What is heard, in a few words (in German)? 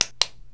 KLICK; Rangers